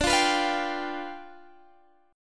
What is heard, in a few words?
G 7th D